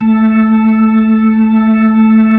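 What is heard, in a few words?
B-3, Hammond B-3 organ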